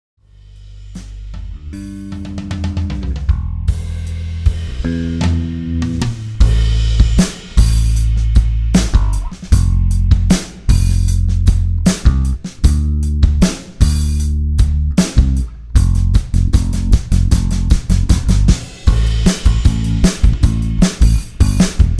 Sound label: No Vocals Guitar